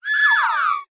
cartoon slide whistle reversed chorus delay funny

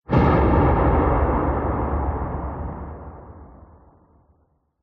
explosion internal reverb distant loud; zapsplat

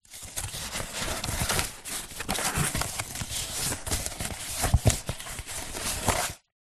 foley cardboard flaps pieces handling; zapsplat

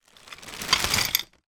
foley broken dish pieces in bag movement; zapsplat

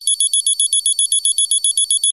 science fiction alarm digital beep loop

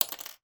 pin needle drop on table; sound effects one

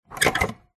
cash register till drawer note clamp lever lift; zapsplat

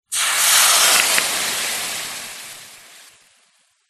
household water sizzle dripped on iron hot

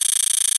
science fiction scanner scanning tone

